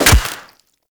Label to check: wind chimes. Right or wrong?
Wrong